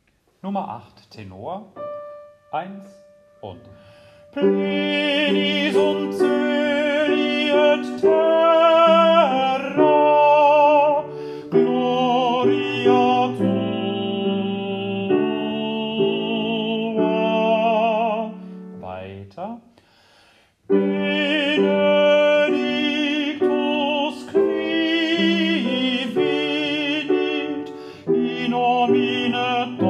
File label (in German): Tenor; Dioezesan Caecilien Verband; DCV Chorfahrt; Übaufnahmen